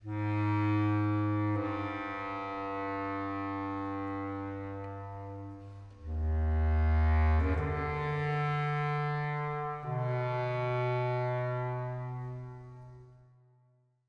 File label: Solo Overlay Phrase 80bpm 4-4meter